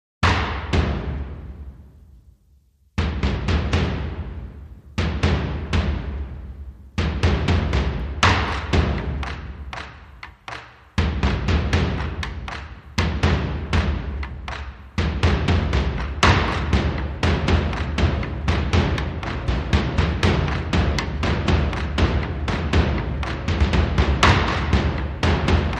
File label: Mid Drums